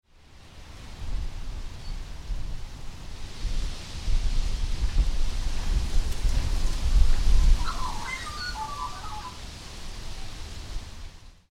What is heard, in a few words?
ambient sound wind and magpies